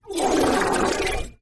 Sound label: fart sound; tiktok